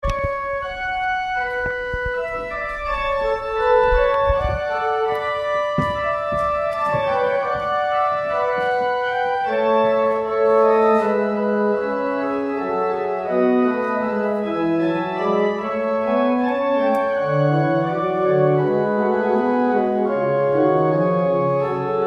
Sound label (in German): Orgelnachspiel; Telemann